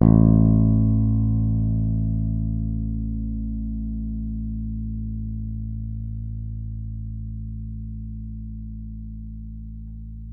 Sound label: finger, bass